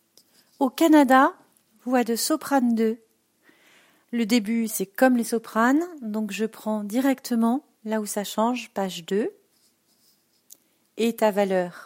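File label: soprano; hymne canadien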